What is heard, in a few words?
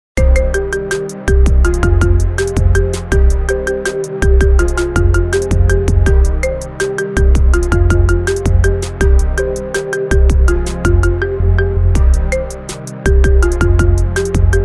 Marimba Cover